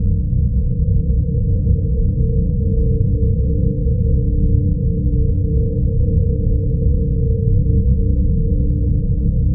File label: corridor, ships rooms; Cosmic Rage